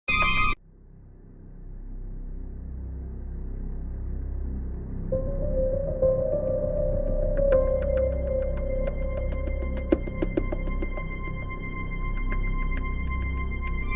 Música instrumental Terror

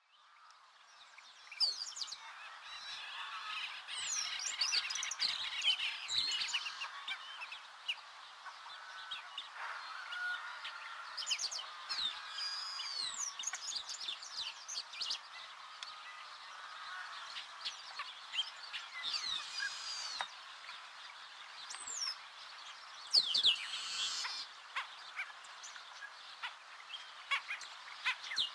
los humedales de quilicura sonido directo

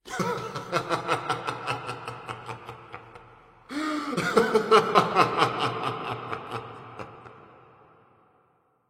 Risa malvada Efecto de Sonido Laughing evil Sound Effect

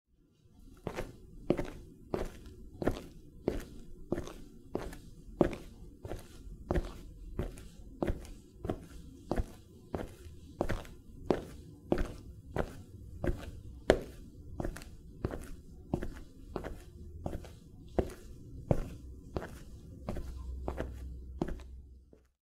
Pasos Efecto de Sonido

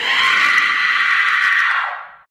Grito

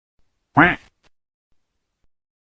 Efecto sonido pato

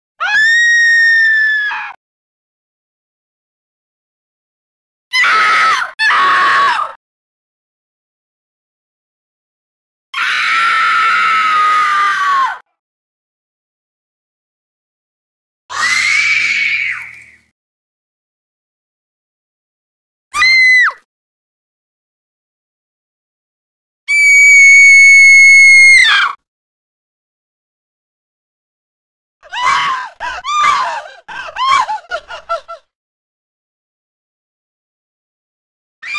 Gritos de mujeres

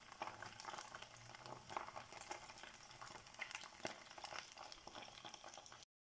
ACEITE CALIENTE

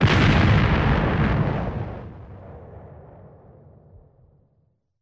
explosiones

